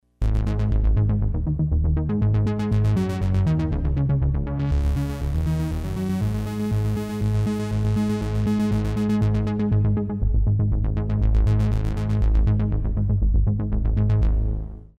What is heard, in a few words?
6 Pole LFO Bass; Kris Rhen Presets